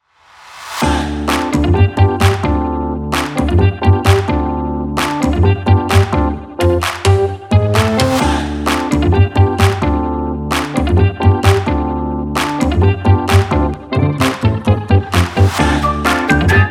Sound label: Fun Quirky Retro Funky Short no drums, upbeat groove funk, BPM130